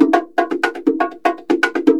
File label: bongos; Drumloops